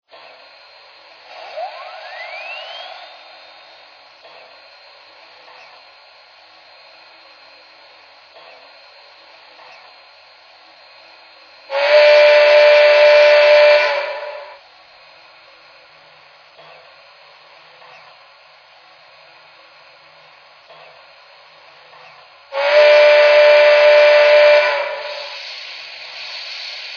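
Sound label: cab forward, trains; sp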